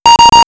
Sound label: beep; singe; Rollercoaster.daphne